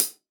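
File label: Acoustic Hi-Hat closed, Roland SPD-S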